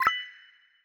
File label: notice common positive